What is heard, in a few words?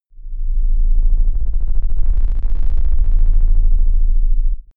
alien dark deep tension; aliens